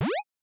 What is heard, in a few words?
Button; Death by AI